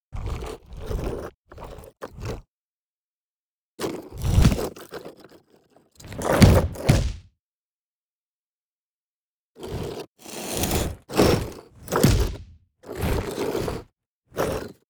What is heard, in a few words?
ancient golem bored; LoL; monsters